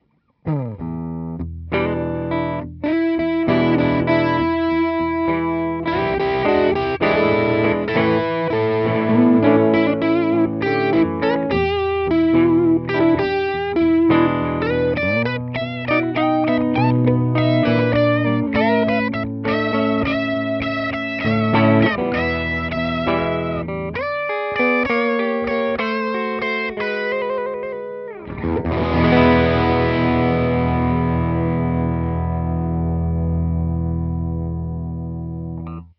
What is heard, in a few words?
Blues Deluxe1 Overdrive, guitar; Blues – Deluxe1 – SHOD Overdrive; ReTube Custom Shop